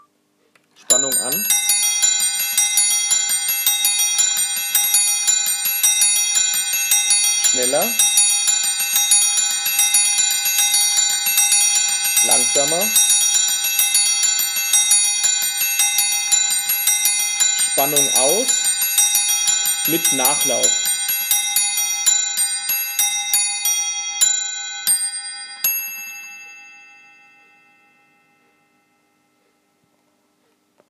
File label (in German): Cymbelstern, Klangprobe